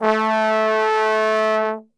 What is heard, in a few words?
A2 BASS TRB, Brass; Best Service; Brass Super Section